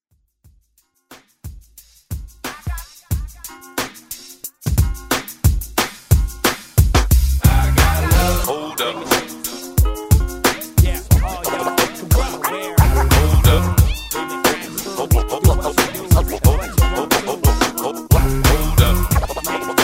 old school redrum

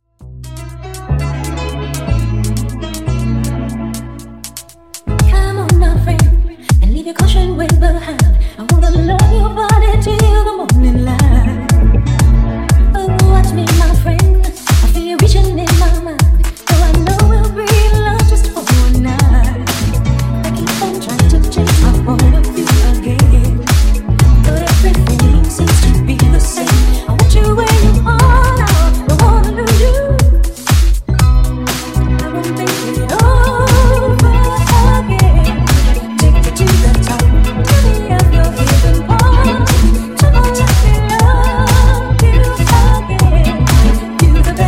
Funk Nudisco 120Bpm

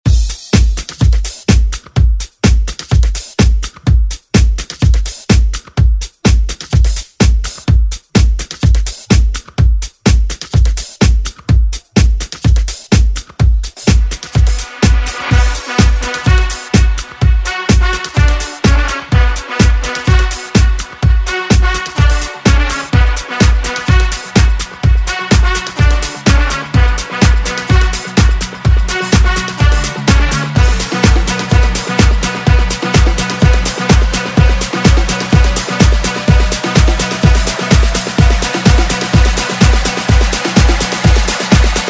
Dance Redrum

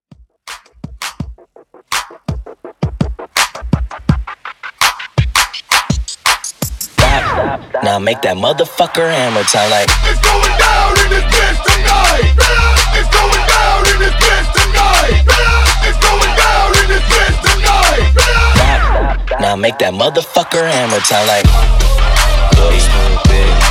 Hype Quick Edit 83 BPM

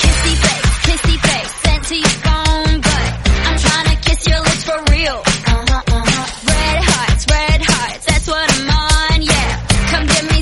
149 bpm Eb

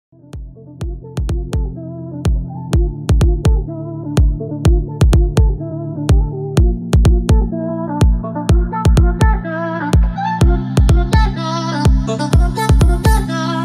In Outro 125 Bpm; djyan

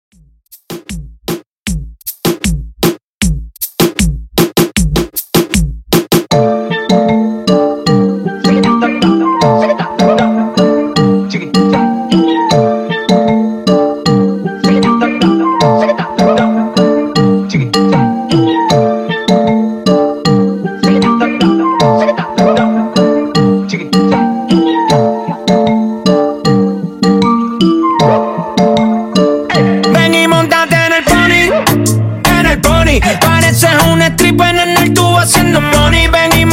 In Outro Reggaeton 155 Bpm

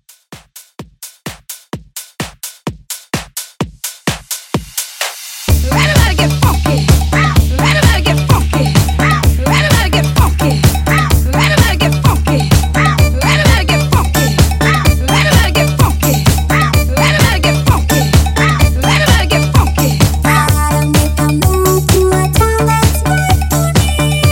Club Reboot 128 CLEAN

